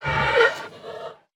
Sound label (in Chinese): 熊猫攻击型声